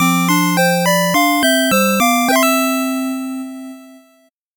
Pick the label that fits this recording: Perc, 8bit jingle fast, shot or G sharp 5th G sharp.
8bit jingle fast